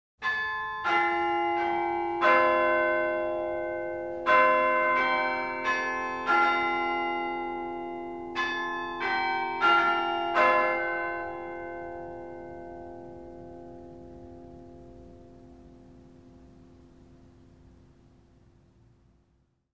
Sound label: School Bell, bell towers; St Pauls